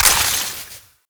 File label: Ammo Pickup Toxin; Ammo Ability; Warframe; Warframes; Frumentarius